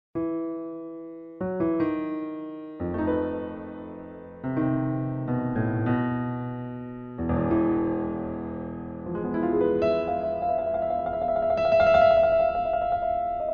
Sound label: Ukrainian Rhapsody for piano